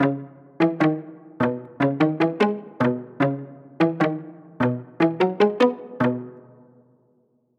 Melody Lead Classical; midi wizard

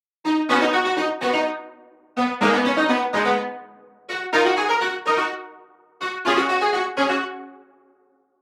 Hybrid Standard Funk; midi wizard 2; Unison

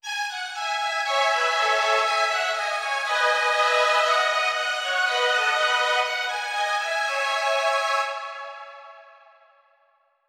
Hybrid Standard Cinematic; midi wizard

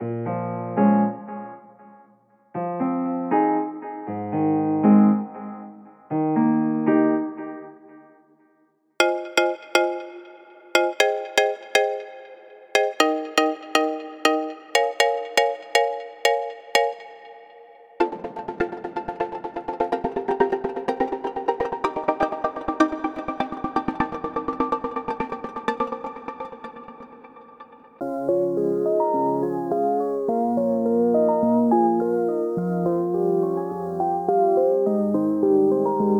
Pop Future Pop

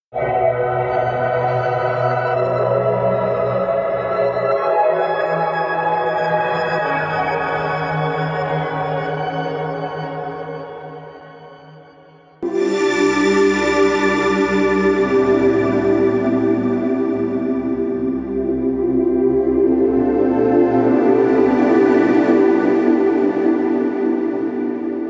Chords Ambient Downtempo

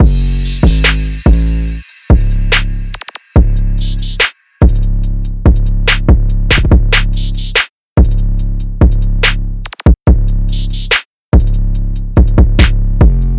143 BPM Gbm; Malicious

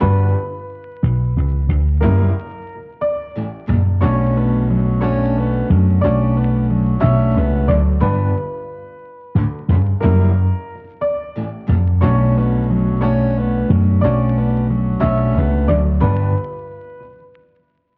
Melody

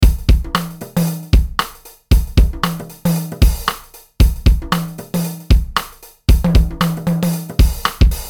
Funk 115BPM

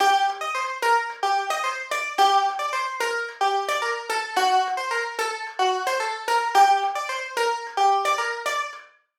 Melody Lead Ethnic; midi wizard

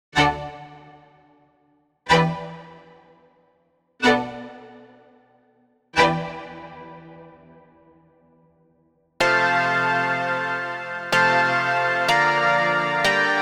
Chords Disco

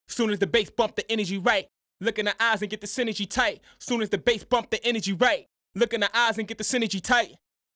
Vocals Aggressive

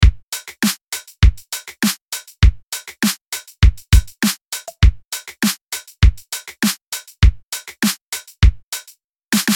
Synthwave Synth Pop 100 BPM

